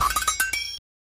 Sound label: Clicky Clack